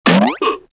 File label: KARATE